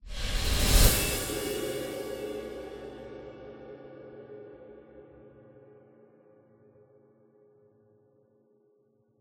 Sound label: JINGLE PLACING START; prodigy tactics windows gamerip 2018